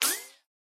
bullet ricochet

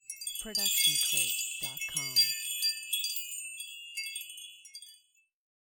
Wind Chimes E

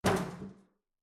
Metal Footfall; soundscrate

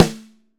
snare; soundscrate

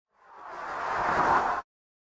ghostly spell short; soundscrate

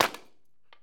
soda can crush; soundscrate

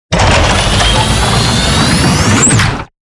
Robotic Movement; SoundsCrate

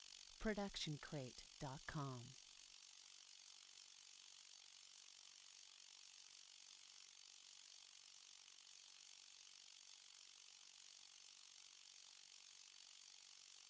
hovering drone; soundscrate

